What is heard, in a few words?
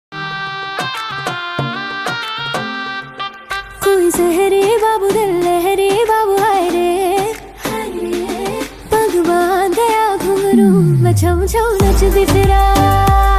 ringtone